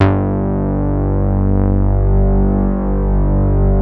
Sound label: MOOG BASS G2; Best Service Dream Experience